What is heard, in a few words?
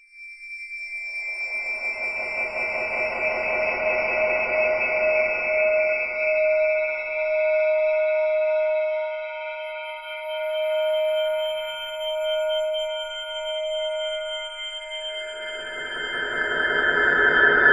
Ominous Drones; USB Soundscan vol.48; AKAI; UBOOT